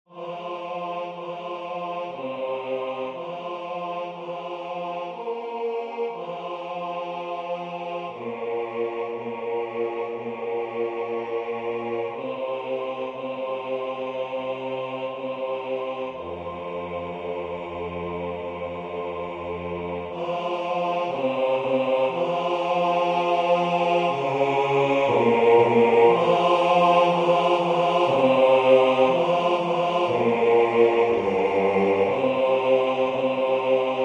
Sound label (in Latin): Hoc est Corpus meum basse